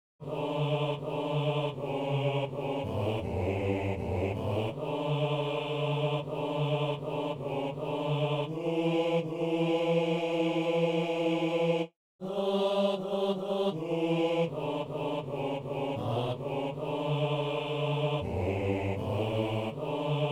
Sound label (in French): hommes; Saint-Denis